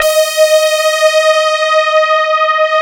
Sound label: CMI PIANO; Wizoo; Powered DX